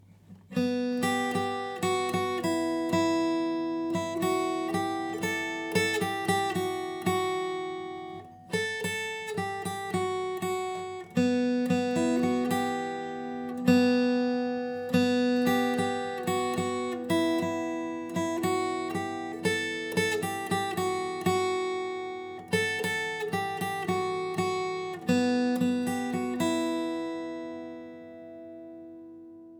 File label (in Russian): na 1 strune